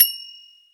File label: orient, Bells